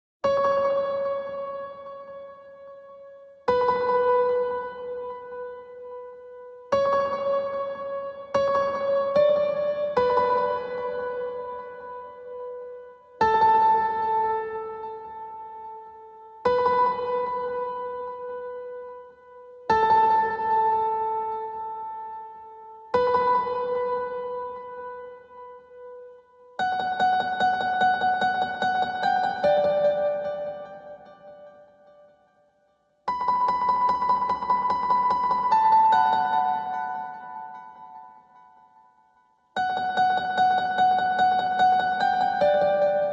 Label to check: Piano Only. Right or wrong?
Right